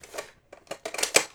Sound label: cassette